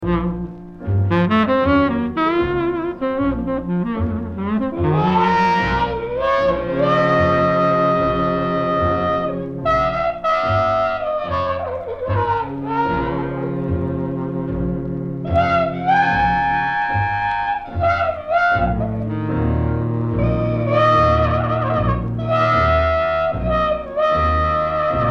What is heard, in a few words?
Trumpet Solo